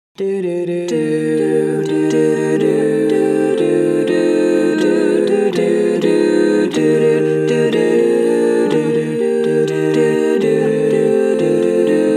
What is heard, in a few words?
Bass solo